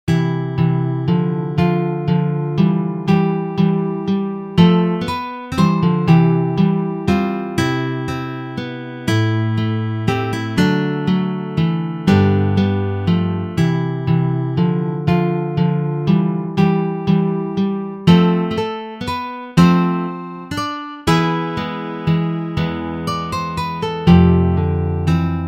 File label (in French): duo guitare